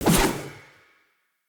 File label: Long Sword Throw; Weapons; Player; Pothia Long Sword; Swords